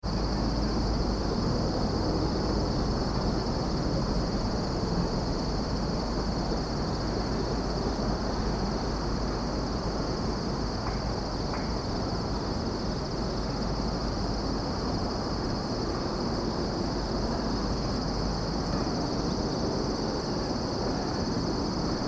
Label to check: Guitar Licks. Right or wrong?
Wrong